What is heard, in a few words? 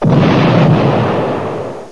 explode